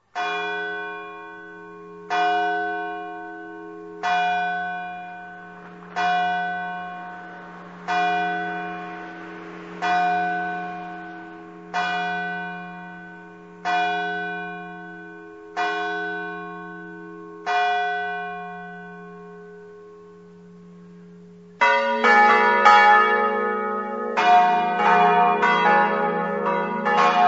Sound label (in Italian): campane; oleis